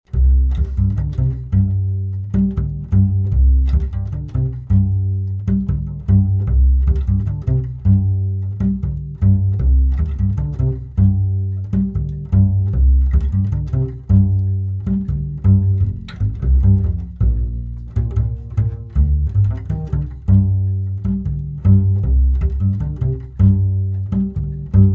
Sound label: bass; The minus melody track is played by bass alone; There is no count off